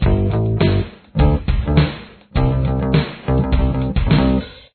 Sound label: bass; 1990s